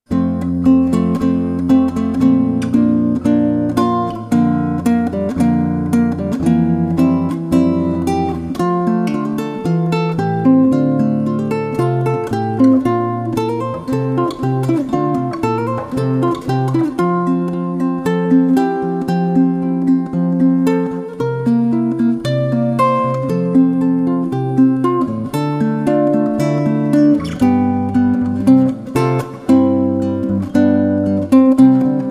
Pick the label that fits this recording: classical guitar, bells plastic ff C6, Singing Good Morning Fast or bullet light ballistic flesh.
classical guitar